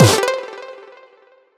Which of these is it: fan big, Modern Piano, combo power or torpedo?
combo power